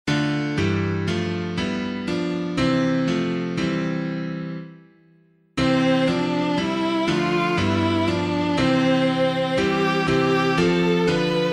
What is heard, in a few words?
Unto Us a Boy Is Born, PUER NOBIS NASCITUR, piano, hymn